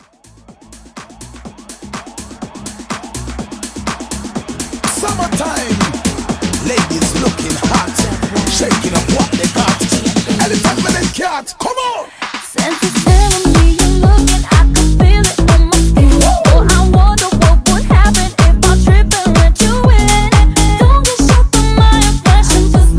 Future House Mashup 124

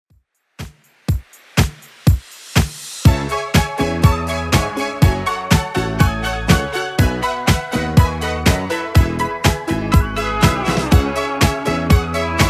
80s Redrum 122bpm

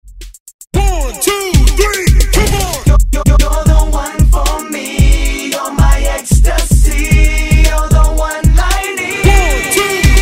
2000s Redrum

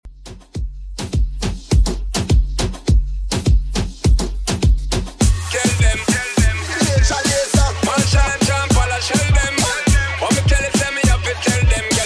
Moombahton 103bpm; Redrum; remixplanet

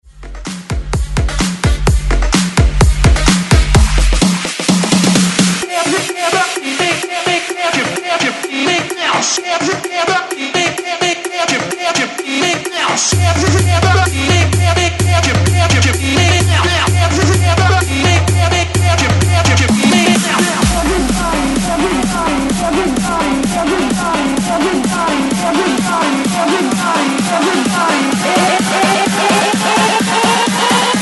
130 BREAKMIX

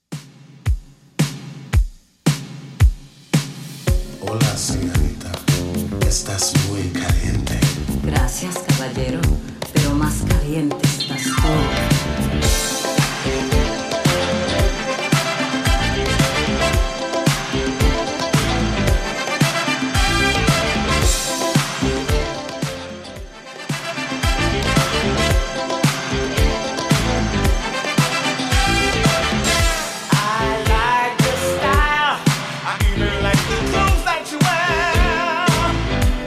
80s Redrum 112bpm

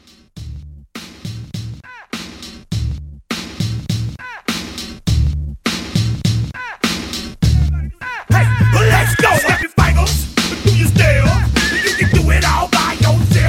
Mashup 102

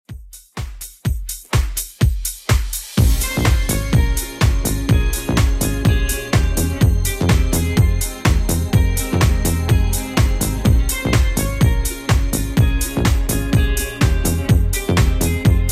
90s Redrum 125bpm

